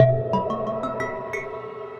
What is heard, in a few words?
Milky Way Bottleneck, Majestic Mallets; Ableton; Mood Reel